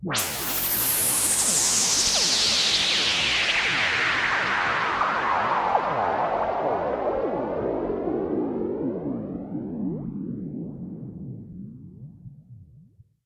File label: Downlifter